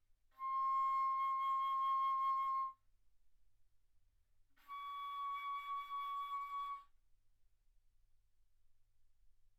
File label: Bass Flute ff C6 Db6